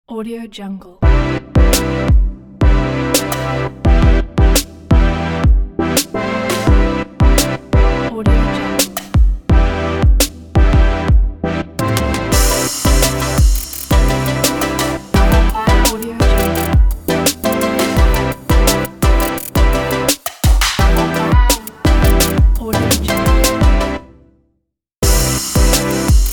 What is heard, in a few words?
modern logo